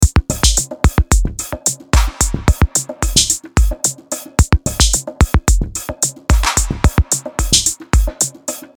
Drum Full 110

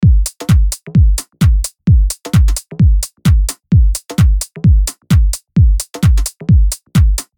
130 Drum Full

